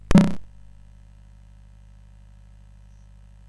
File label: CSG8580R5, GAMES; Street Rod